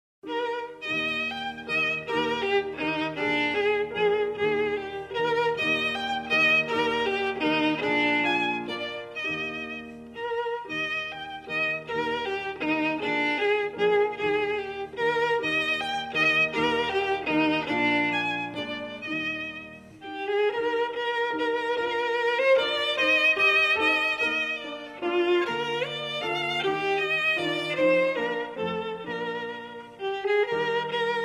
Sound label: German Dance, violin; Suzuki Violin Method; K.D. Dittersdorf